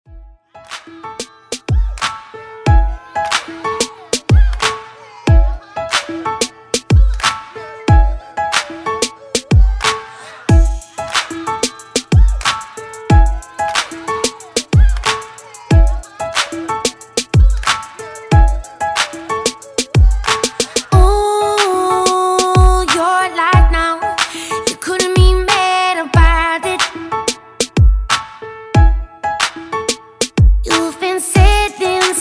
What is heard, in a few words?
Top40 Redrum